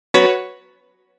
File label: Error Notification; SMB; Simescar